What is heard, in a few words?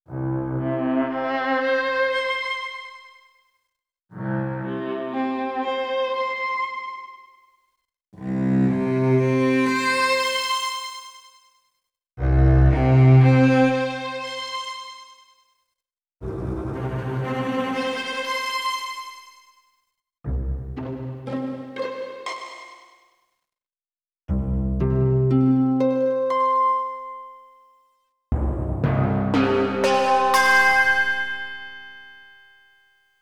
Strings